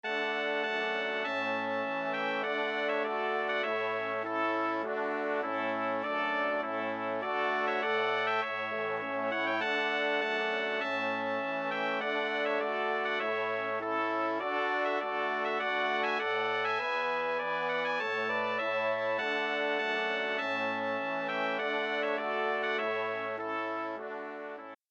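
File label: Trumpet Tune in D Major, Brass Quintet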